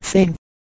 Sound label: sing